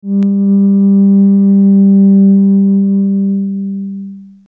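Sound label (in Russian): диджериду одиночный мягкий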